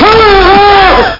oooww; worms; arnie; amiga

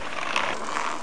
roulette

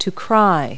cry; Multi-Lingual Talking Picture Dictionary

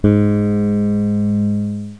g#, bass; Virtual Graceland

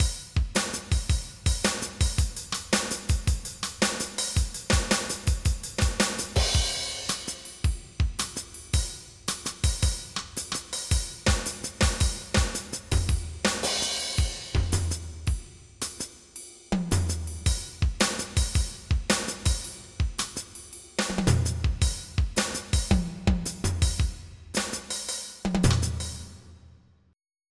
big band; jazzy; MIDI Music File

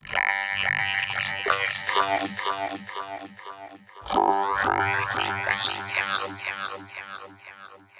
vocals; Evolution

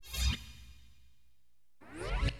STAB, AcidJazz Funk Fx; LiveAct Pool

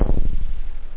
synth; da capo vol1; drums bass

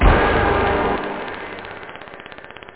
tronic, stabs

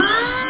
Pipe Snd; Arkanos

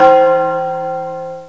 church; Herrscher

